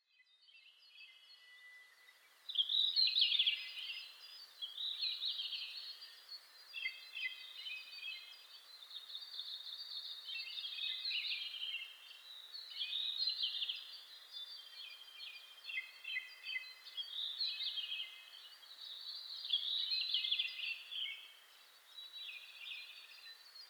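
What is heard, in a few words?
red-flanked bluetail; ruribitaki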